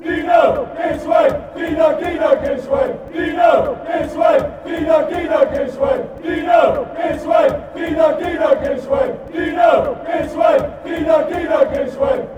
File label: give us wave, fan chants; deano